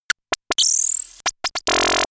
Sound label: small working droid